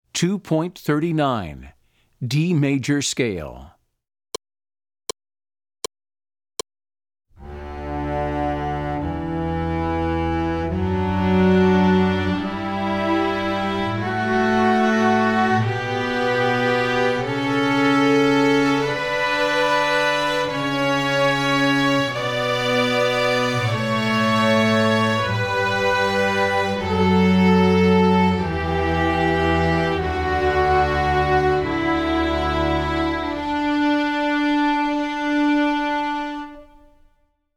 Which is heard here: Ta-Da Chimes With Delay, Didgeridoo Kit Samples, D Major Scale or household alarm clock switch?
D Major Scale